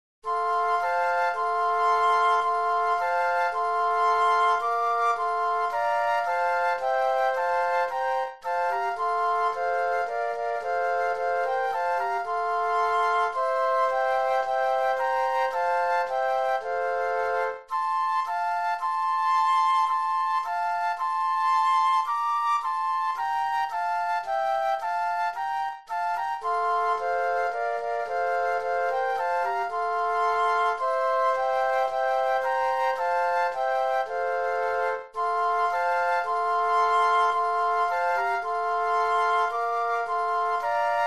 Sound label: flute trio